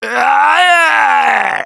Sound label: Death Scream, Survivor Voice; Gambler; russian